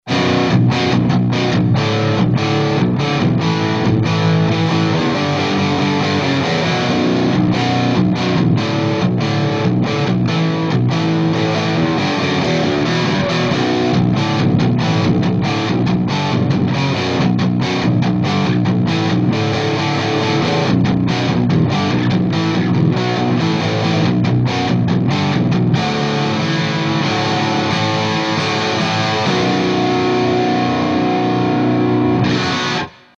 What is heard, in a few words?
riff baritone